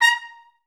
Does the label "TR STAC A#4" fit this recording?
Yes